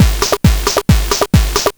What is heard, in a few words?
Game Boy Kick Loop, 8-Bit, Drums